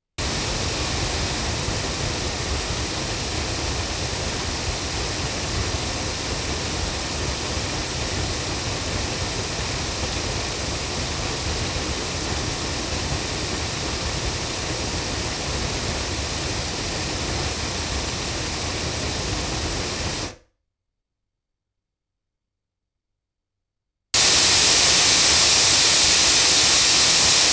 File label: 8 loudspeakers